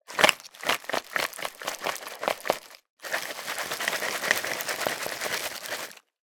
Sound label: food candy in wrappers shake in plastic bowl; halloween trick or treat; zapsplat